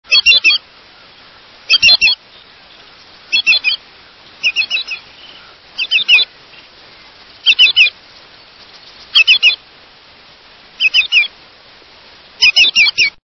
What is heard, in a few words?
Greater Yellowlegs, songs